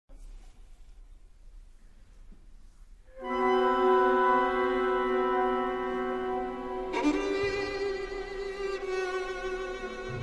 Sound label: violin concerto